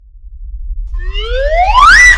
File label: zoom in